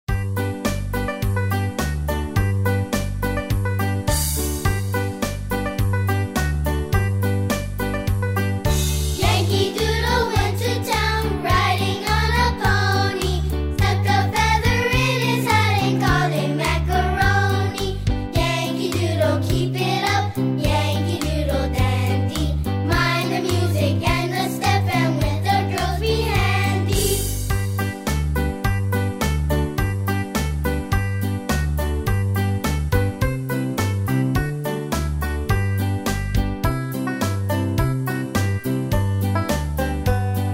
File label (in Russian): Детские песенки на английском Yankee Doodle